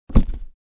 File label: Boot drop on solid surface carpet; Clothing Sound Effects